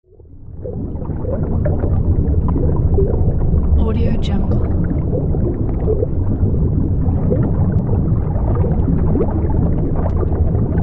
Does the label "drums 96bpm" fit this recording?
No